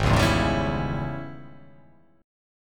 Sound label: G sharp Major 7th Suspended 4th Sharp 5th